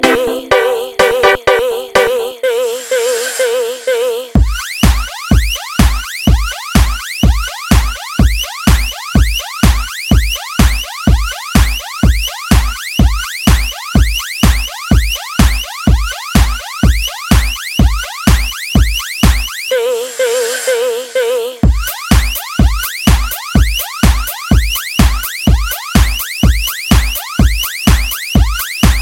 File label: Electro